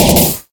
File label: DBgun Metal, Damage